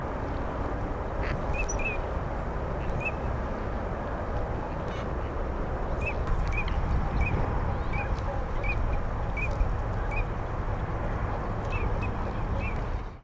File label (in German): krickente